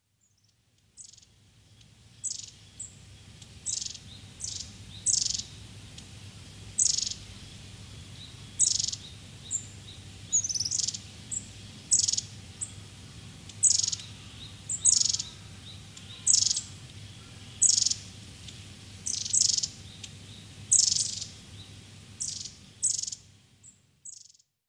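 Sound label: long-tailed tit; kokubunji; koe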